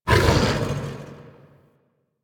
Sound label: Axe Weapon Idle; Warframe; Tenno; Wolf Frame